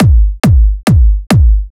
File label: 138 BPM Kick; Drums & Perc; Bminor